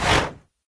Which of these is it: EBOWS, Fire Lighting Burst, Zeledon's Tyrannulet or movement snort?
movement snort